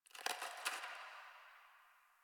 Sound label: Ice Crunch; Warframe; Ambience; Nineteen Ninety Nine; DE